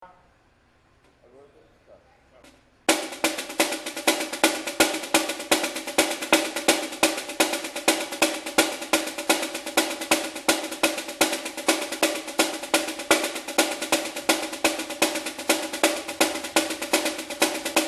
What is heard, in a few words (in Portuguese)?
caixa; ivsom; mocidade